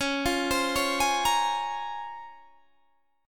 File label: Db Major 13th, Guitar Standard; arpeggiated; JGuitar